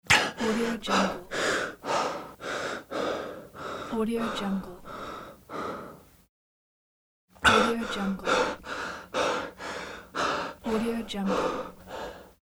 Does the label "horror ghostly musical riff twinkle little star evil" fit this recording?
No